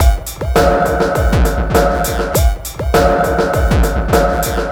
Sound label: Electronica Drumloop